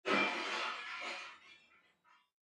Crash Glass Impact; windows & mirrors

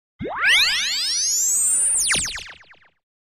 Synth Energy Zaps

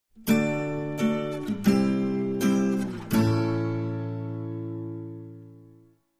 Acoustic Guitar Ballad

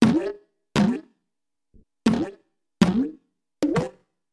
Cartoony Bounces, boings

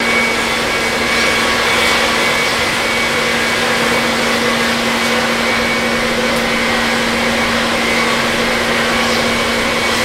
Jet Idl Loop, taxi

